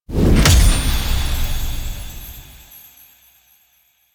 Crafting Purchase; Warframe; UI; Cetus

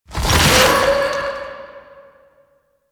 Citrine Protective Shell Charge B; Warframe

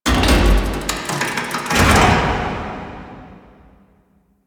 Connector Shrine Deactivate; Warframe; Ambience; Entrati

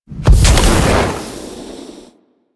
Heat Beam Fire; Enemies; Abilities; Warframe; Corpus; Arachnoid Camper Terra

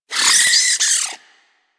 vocal death, critters; Warframe; Hunting Critters; Nexifera

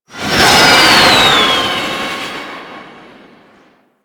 Rocket Launcher Projectile; Weapons; DE; Warframe; Tenno; Lasrian

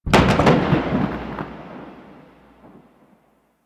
Gas Dist Cloud Explosion; Ambience; Warframe; Corpus Gas City

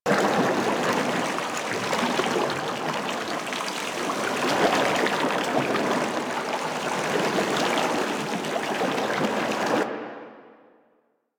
Coolant Stream Loop; Warframe; Venus Open World; Venus Solaris United

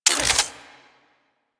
Modular Secondary Clip Mech, Weapons; Warframe; SU Modular Guns

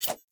Dagger Whoosh; Sinecure Dagger

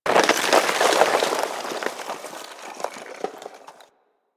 Landscape Ice Slide Medium, Ambience; Warframe; Venus Open World; One Offs

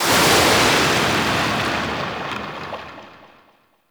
Ocean Wave Crash Large; Warframe; Grineer Ocean

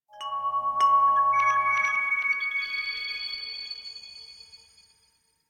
Chimes; Warframe; The New War; Narmer Outpost

